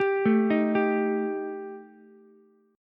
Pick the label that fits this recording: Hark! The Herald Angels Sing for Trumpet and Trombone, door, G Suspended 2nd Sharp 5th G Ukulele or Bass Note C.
G Suspended 2nd Sharp 5th G Ukulele